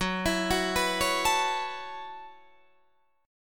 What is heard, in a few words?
Gb Minor 11th Gb Guitar Open D; down; chord shape